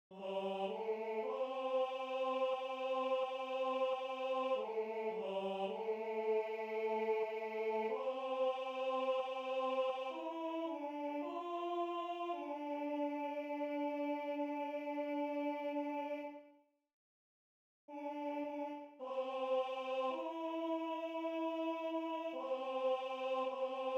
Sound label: tenor